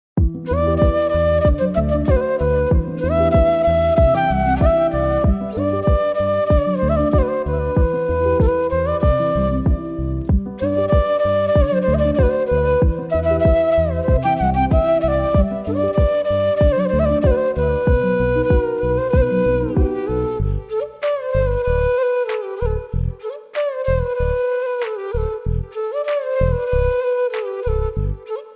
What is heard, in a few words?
instrumental ringtone, flute ringtone